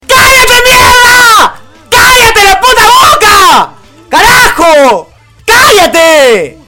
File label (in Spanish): rage